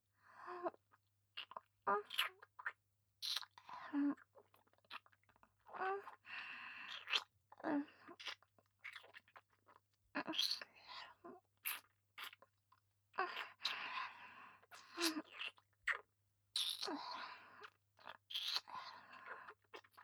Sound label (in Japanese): ディープキス（ひかえめ）; 【甘々媚びアクメ】性欲旺盛な陸上部の先輩後輩JK、ベロチュー青春溺愛えっちでコーチの汚ち◯ぽ生ハメ特訓♪